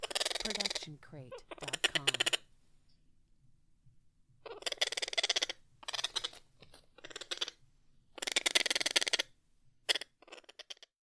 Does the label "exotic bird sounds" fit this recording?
Yes